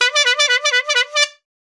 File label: Attack Prepare; Showman